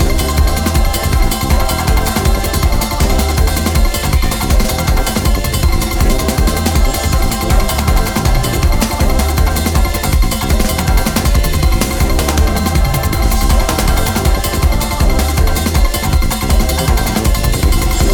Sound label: big win loop